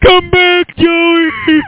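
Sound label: monster; supr doom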